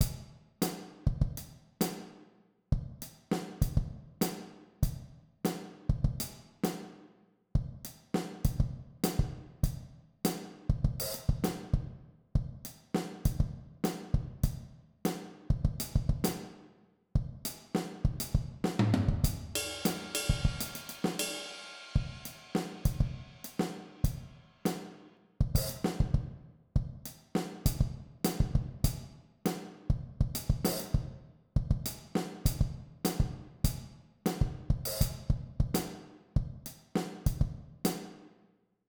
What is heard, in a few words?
Syncopated funky funk, drum set